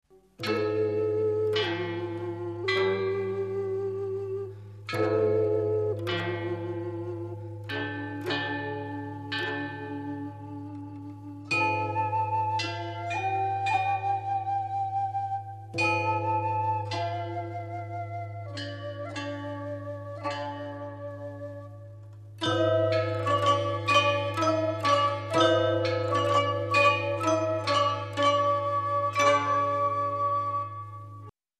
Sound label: bells